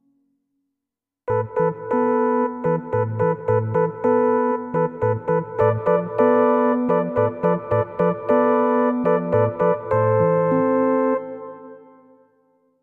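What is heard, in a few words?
Solo Sine, digital piano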